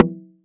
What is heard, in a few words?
Tom